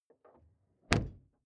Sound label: car door close exterior hummer h3; Cars